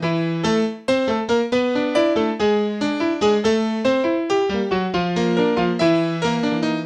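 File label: Latin Jazz Piano A minor; Grand Piano; Digidesign; Pro Tools Essential Loops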